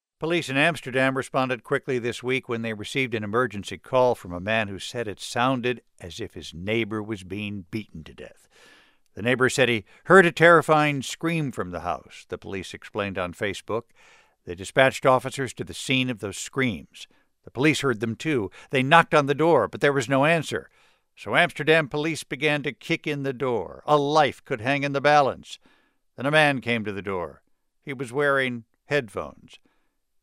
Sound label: falsetto alarm neighbors screeches; warrant a police visit